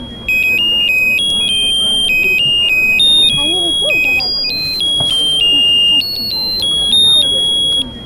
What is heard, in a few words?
happy birthday card